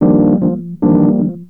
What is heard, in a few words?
Rhodes, Keyboards; Total Drum&Bass